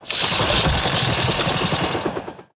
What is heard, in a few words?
Claw Machine Attack